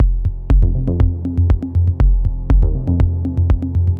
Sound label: boss loop; smash hit gamerip